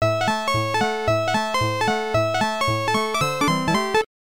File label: Game Start; Namco Classic Collection Vol. 2; arcade; Namco ND-1